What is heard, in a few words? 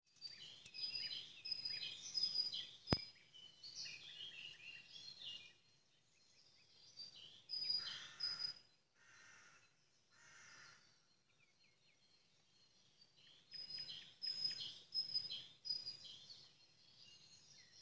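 Sounds of the dacha; Zvuki dachi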